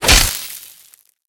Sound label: fx laser impact